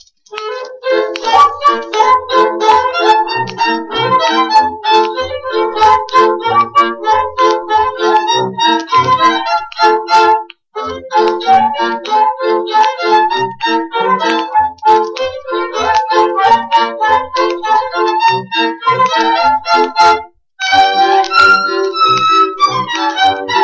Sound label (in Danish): polka i F dur kvartet